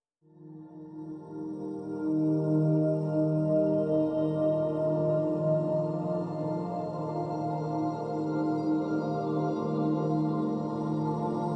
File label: Orchestral, Music